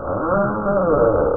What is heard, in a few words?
squeak